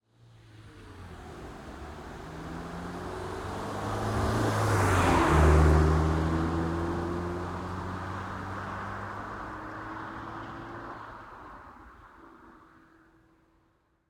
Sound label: renault kangoo 1.6 16v exterior slow passby while slowly accelerating; stereo; SoundHolder